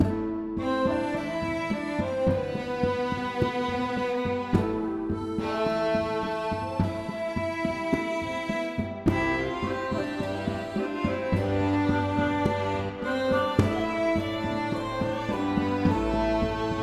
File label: pub; Shadowhand; macos windows gamerip 2017